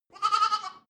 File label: goat lamb baa